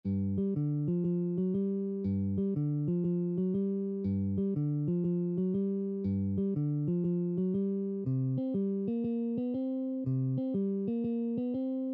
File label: Easy Rhythm in G